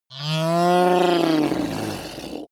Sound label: reptile choke call; kreaturen